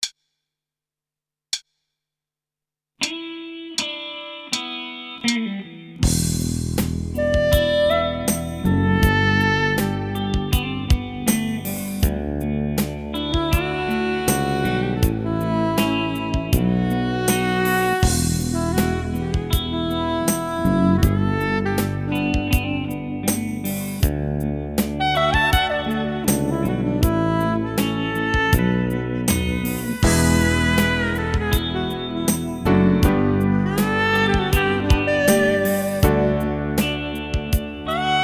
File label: Rock Funk